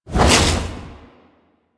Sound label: Wraith Reaper Double Jump; Warframe